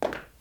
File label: Marble Foot Step